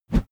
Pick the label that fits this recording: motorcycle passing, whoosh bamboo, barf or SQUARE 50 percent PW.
whoosh bamboo